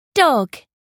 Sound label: dog